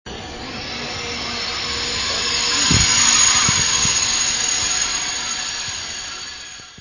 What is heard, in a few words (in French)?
texture crissement train